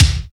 studio kickdrum sample d key, kick one shot tuned to the D note